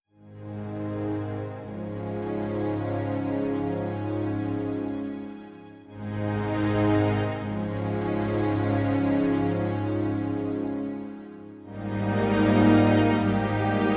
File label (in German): Weites Land 114 BPM